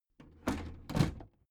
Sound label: big plastic case lid put down soft